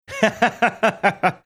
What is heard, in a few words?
chuckling